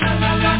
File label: LALALA